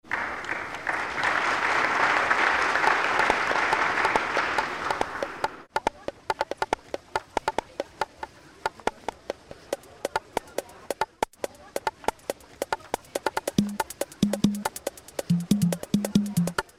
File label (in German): brass live